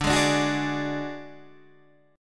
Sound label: D Major Flat 5th D Guitar DADGAD, chord